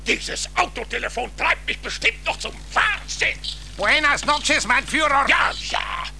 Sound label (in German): Autotelefon; bar simpsons